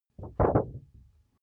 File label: paper wobble pickup